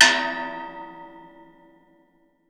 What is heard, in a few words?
waterphone